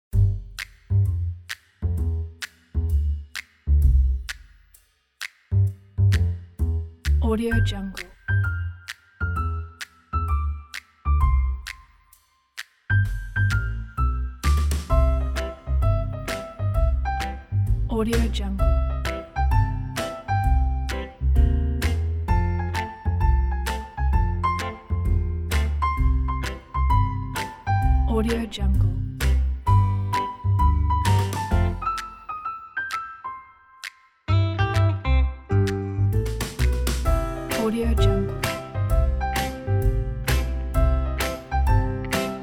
lite jazzy piano swing

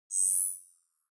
ui classic zoom out light high

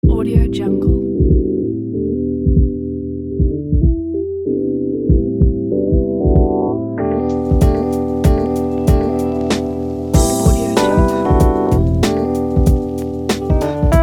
hiphop chill

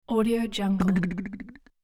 vocal sproing; sound-ideas

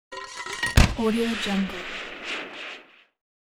grenade or mortar launcher

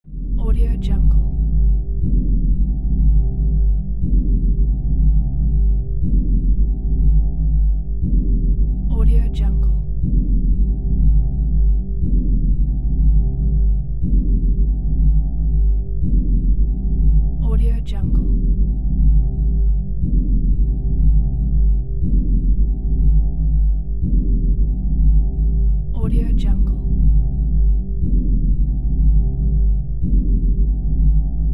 futuristic ambient

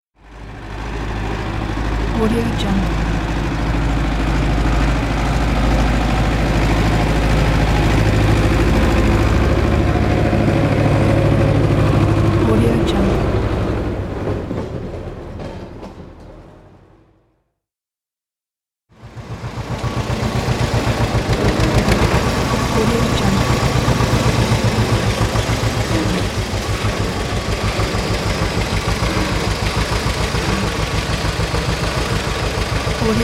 industrial machine pack; soundfoodsfx

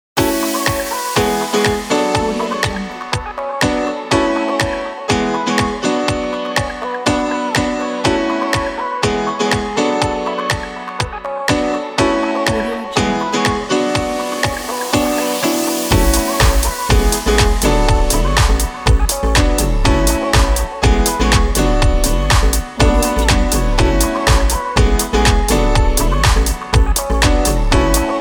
chill dance party; daniel-sheen-music

